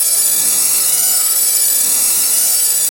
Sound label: continuous fairy sparkles